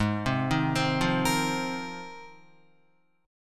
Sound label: G sharp 6th Add 9th, Guitar; arpeggiated; JGuitar